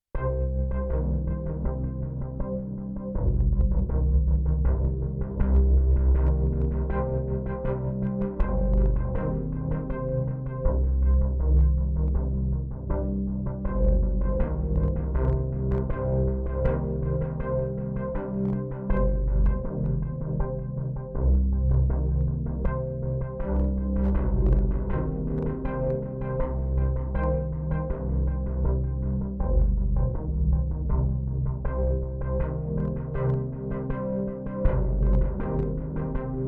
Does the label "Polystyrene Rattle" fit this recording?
No